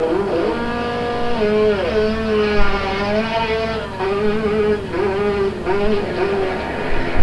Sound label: start